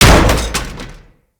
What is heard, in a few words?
Body Fall Default; Moa; Body Falls